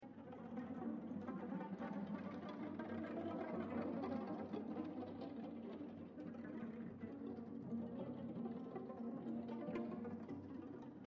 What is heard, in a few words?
Leadup